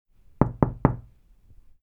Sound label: Knock Knock